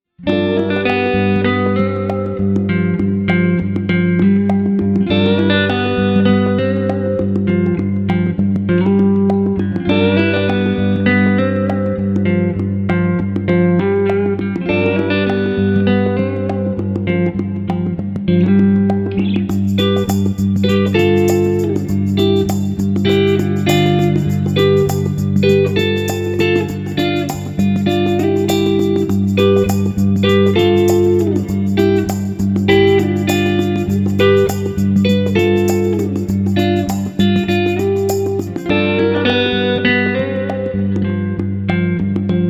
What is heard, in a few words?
Cigar Box Blues